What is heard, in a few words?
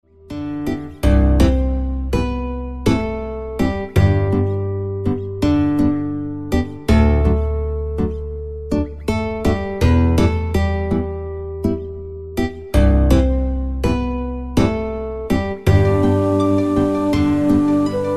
hawaiian guitar